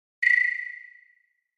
Flash Beep HF String P, flash beep

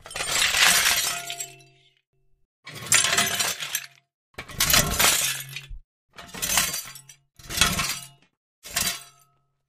Glass Spill Sml Shovel

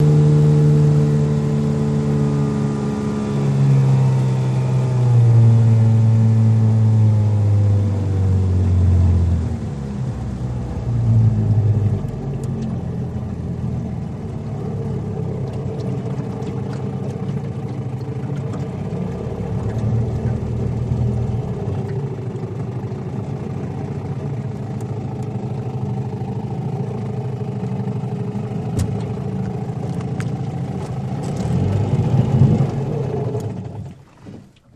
9.9 Evinrude Boat Pull Up Shut Off, On Board; 9.9 hp Evinrude

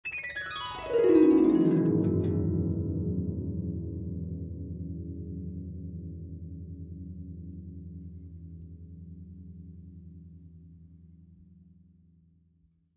Harp Desc Gliss 1; strings harp

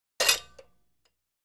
Free Weights Exerci

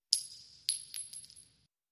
Shell Case Sngle Revb, shell casing movement

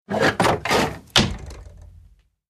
Hanging Kick Awy Chai, crashes & creaks

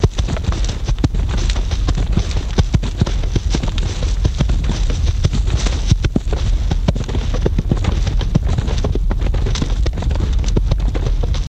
Horse Gallop Half Spe

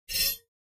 Mouse Over Elect, mouse over

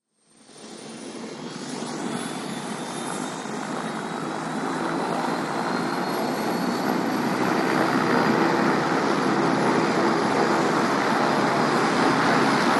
Heli Jet Warm Up Hover

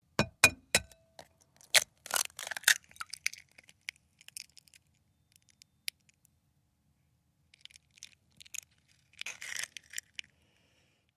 Cracking Egg A Bowl, kitchens

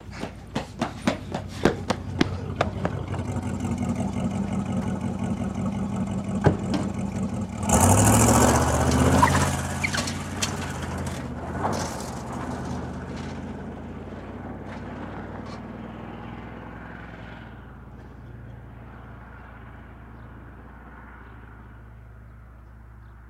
Cadillac Idle And Away

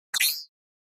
Button HF Elect Buzz

